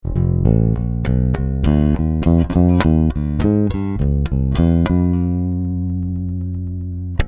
finger neck

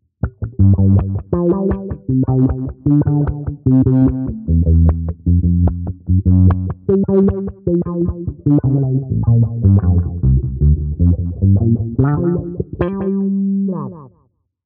T Wah Delay

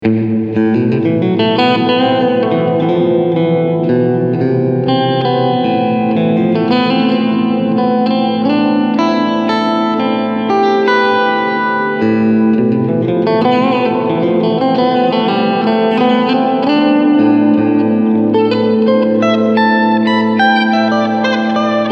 Maximum Decay

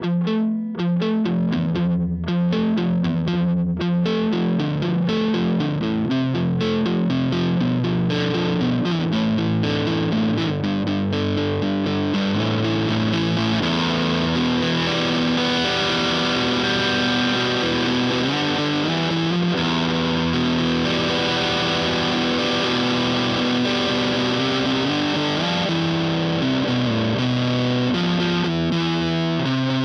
Epiphone SG Neck

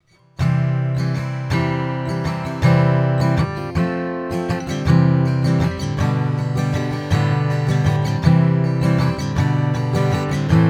Acoustic Git HiZ Zoom L 12

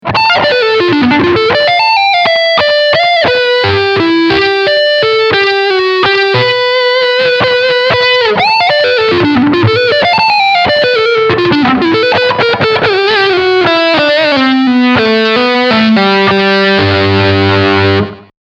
Lead Front Humbucker

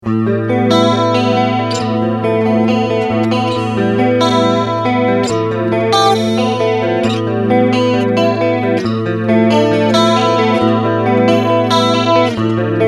shimmering flange

